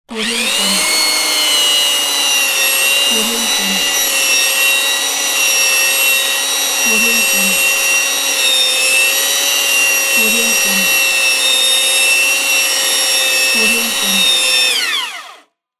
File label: belt sander; monochromeeffect